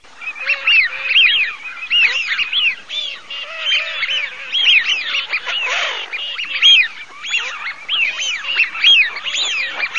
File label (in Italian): fischioni alzavol bassa, birdsound